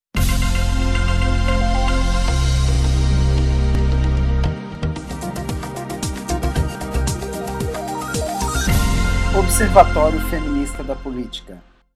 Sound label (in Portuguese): vinheta; observatorio feminista